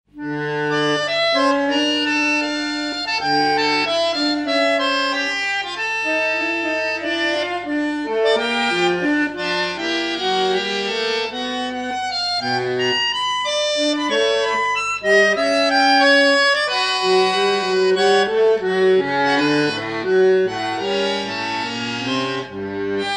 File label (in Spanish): Prel Re bandoneon; BANDONEON SOLO